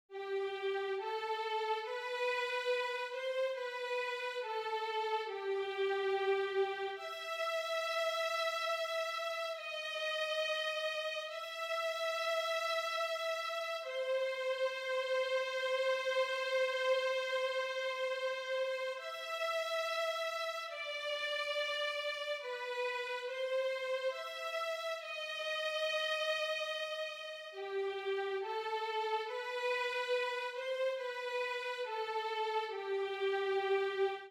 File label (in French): VIOLONS; Viens Seigneur et sauve nous